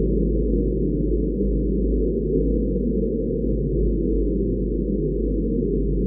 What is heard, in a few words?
ambient